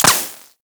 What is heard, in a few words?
Laser Impact Metal; Warframe; Weapons; Bullet Impacts